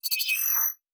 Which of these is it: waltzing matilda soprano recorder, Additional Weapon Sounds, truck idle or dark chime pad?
Additional Weapon Sounds